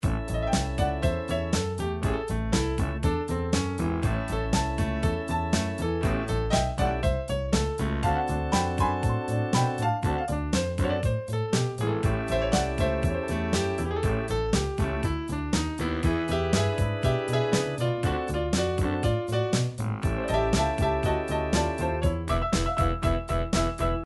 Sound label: rock and roll, midi